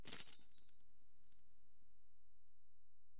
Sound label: multiplier win; serengetikings